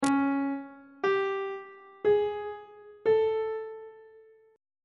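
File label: d flat diminished 5th perfect 5th augmented 5th intervals; on treble clef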